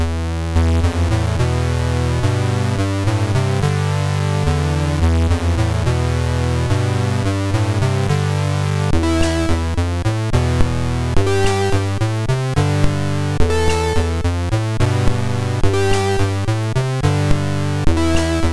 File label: T-Rex 64, MOS6581R2